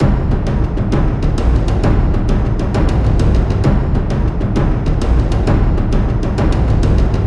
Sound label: Ambush Percussion Loop; Blackthorn Arena (Windows) (gamerip) (2020)